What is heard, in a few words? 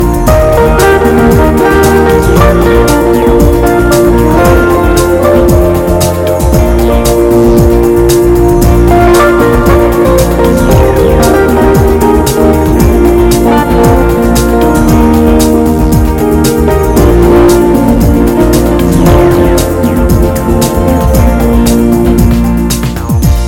Hot Fast Ballad